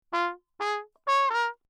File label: TRP MUTE Bach ohne phrase; Perinétventiltrompete; Mutes